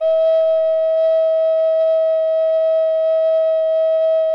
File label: E5, kobliczek; SoundBytes - Early Patches